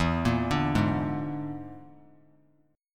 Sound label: E 7th Flat 5th, down; E7b5; arpeggiated